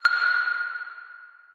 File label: chime